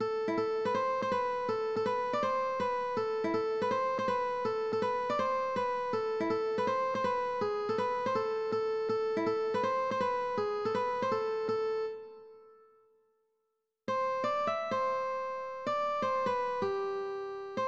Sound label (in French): Gavotte